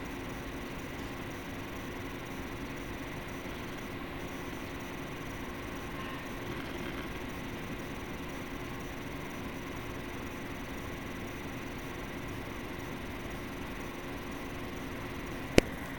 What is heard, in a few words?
noise busy; ENGTX580DCIIreview; ENGTX580DCII940